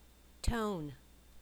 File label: Tone